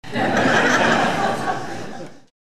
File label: people laughing